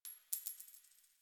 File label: Coin Drop; Warframe; Nineteen Ninety Nine